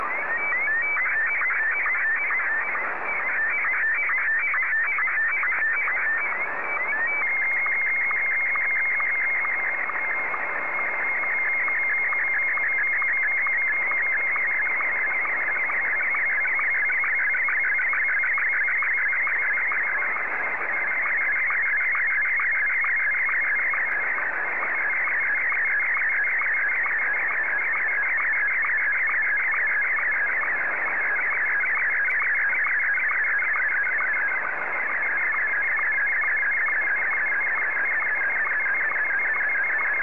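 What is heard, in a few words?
Martin 1, slow-scan television; JA3QJQ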